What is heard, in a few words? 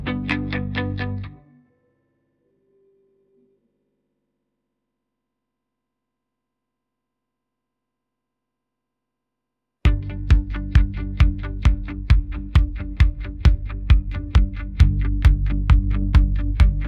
ringtone pop